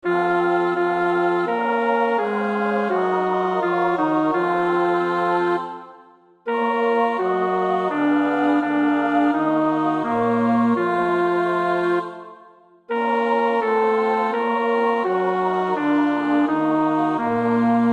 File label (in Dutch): bas, koorpartij; Bach (Johann Sebastiaan); BWV 245 Johannes Passion